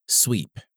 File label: sweep